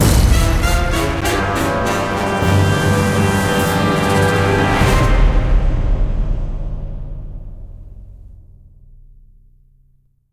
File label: Terror Sting; Jurassic Park The Game